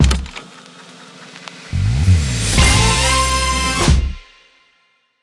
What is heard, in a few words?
Unlock Picture Mainline Mission; Subway Surfers City Sound Effects; Android